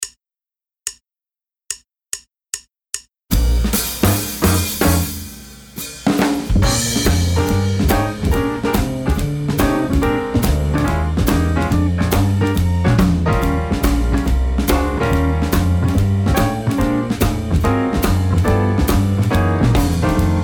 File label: Improv and Jam Track, jazz orchestra